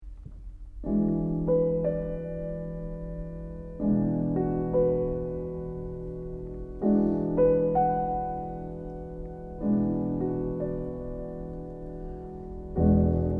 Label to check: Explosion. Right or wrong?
Wrong